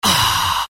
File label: "ah" exhale